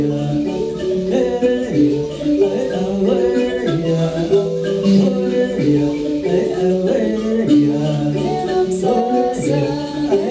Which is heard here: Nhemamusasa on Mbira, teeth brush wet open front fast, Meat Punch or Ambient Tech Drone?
Nhemamusasa on Mbira